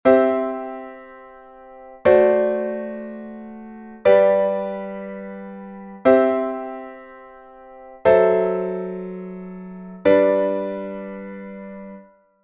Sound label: modulation